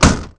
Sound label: Door Bang Latch